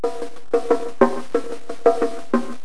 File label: JUNGLE; Microsoft Office 95 Professional; VALUPACK; COMPADRE